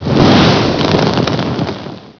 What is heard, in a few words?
burn; valve; boogycross